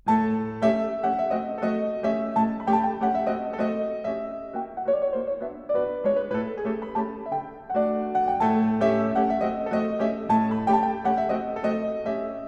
Mazurka In D Major, Piano; Chopin

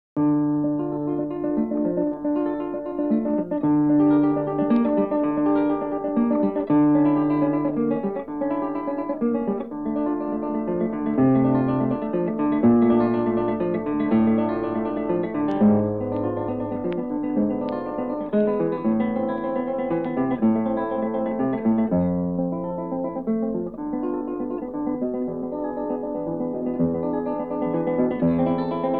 Prelude in C Minor for Lute; BWV 999; Bach; Guitar

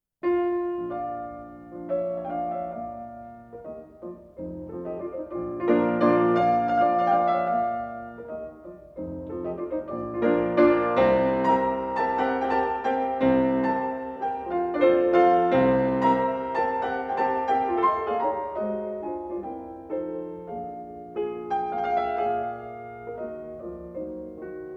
Mazurka in B flat major, Piano

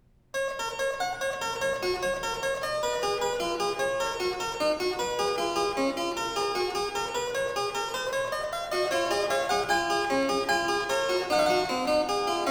Fugue in G; Fugue 15; BWV 884; Bach - WTC - Tilney

